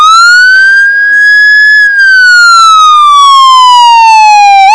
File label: SVP Wail